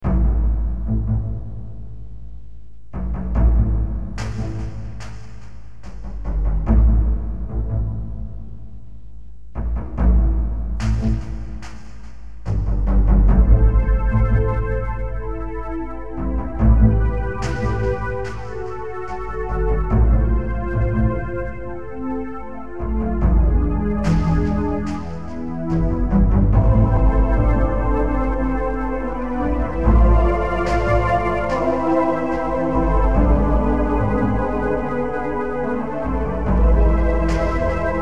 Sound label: fortress ambient